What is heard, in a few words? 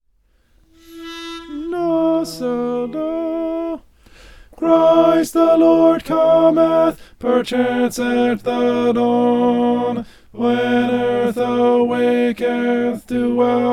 tenor; christ the lord cometh